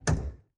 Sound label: Aircrafts Rudder Lim; DCS